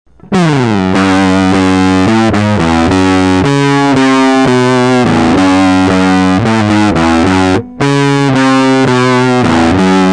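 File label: Bazz Fuss v3, guitar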